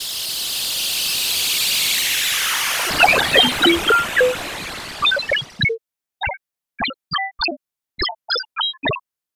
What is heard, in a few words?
Bubble Synth, Trip-Hop Loops; Sonic Foundry (Sony Creative Software); Rondo Brothers Trip-Hop Loops & Samples; Liberation